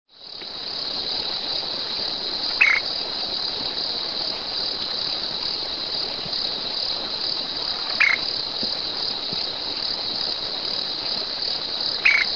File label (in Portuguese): Elaenia obscura Tucão